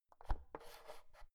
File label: oven knob press in soft